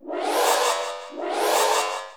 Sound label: Magnet